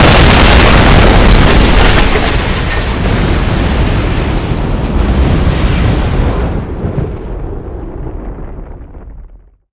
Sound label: crushing concussive deafening explosion